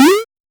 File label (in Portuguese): som de pulo simples de